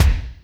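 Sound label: kick Lo Techno RM, Alesis D4; 300 Drum Machines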